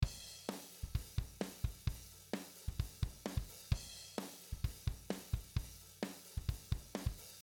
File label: drum